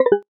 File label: wizard error